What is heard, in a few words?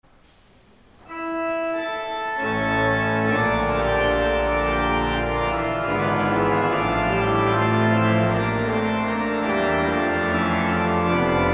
Organ, Chorale Prelude; Johannes Brahms; 'Herzlich Tut Mich Verlangen'